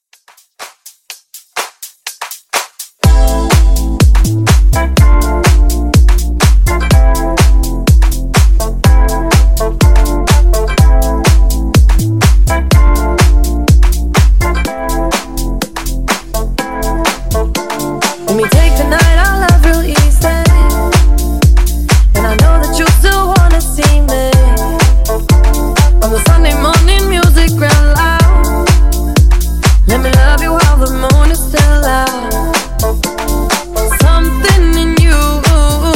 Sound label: Funk 124 Bpm